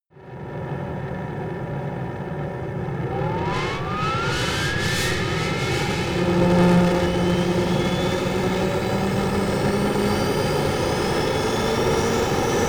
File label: military jet fa-18 hornet turbine rev up